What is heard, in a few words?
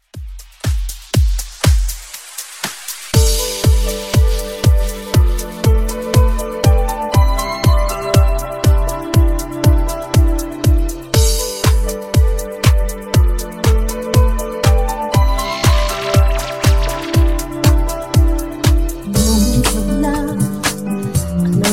Funk Remix 120Bpm